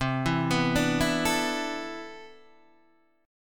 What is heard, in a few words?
C Major 13th C, Guitar DADGAD